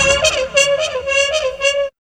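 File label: trumpet riff; X-Static Goldmine 1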